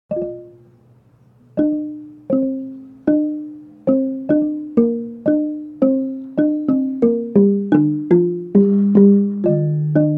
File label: pangkur example gambang